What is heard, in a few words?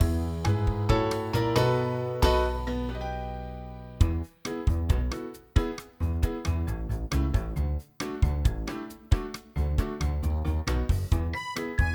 Kid's Game